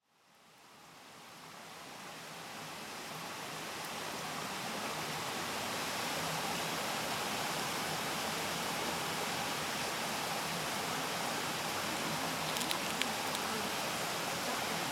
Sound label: Gatehouse Woods Soundscape